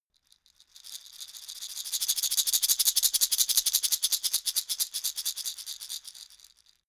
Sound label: shaker wood rattle dynamic